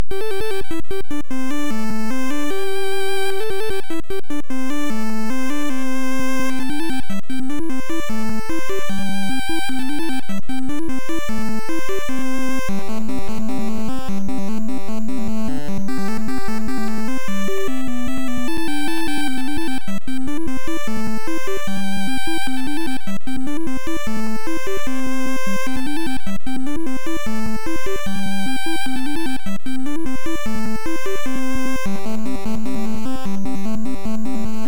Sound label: Japanese Village; The Flintstones The Rescue of Dino & Hoppy